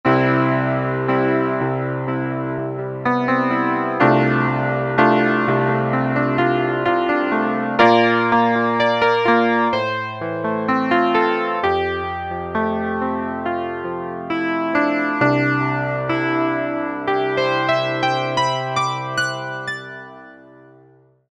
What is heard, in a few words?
PIANO, Ensoniq ESQ-1